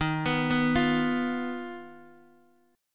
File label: D sharp Suspended 2nd Sharp 5th D sharp Guitar DADGAD